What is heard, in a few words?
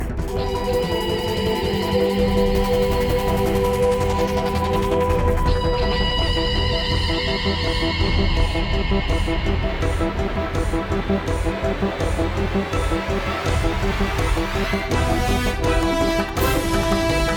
Drone On; Doggone Christmas